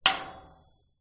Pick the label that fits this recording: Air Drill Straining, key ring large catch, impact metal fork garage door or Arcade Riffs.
impact metal fork garage door